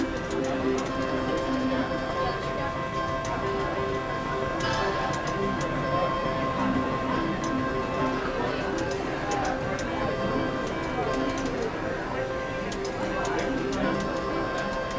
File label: vegas slots; kasino2